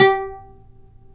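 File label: pluck OUD G; Roland - LA Composer vol. 3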